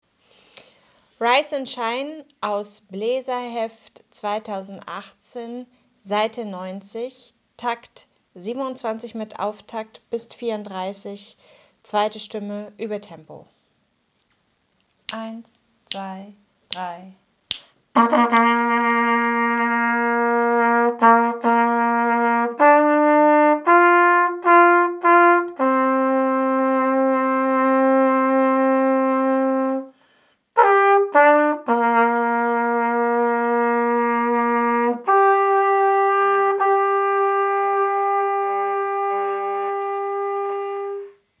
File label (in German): Rise and shine Stimme 2, Posaunenchor; Uebetempo